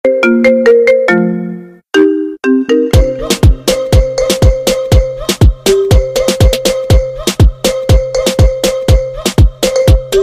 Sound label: marimba remix; scooby do